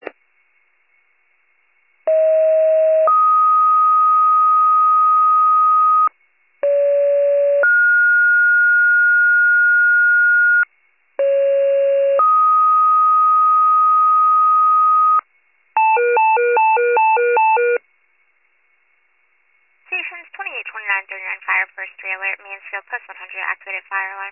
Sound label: fire, pager alert